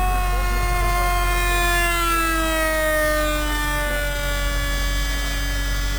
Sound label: corsair webra 9X4; Meritve hitrosti